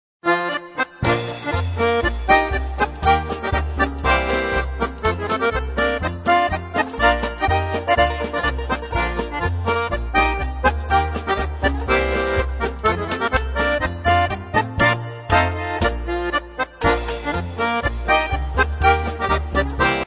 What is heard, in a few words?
polka